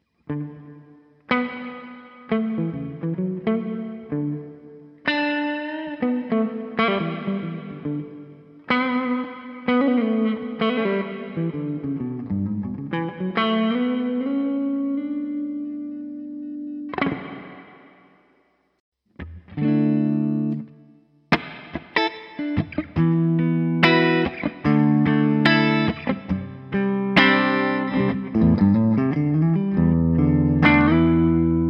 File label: Plate